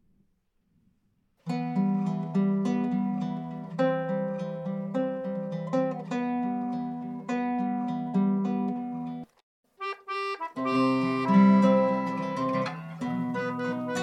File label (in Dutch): woorden karaoke; musical de goede bakker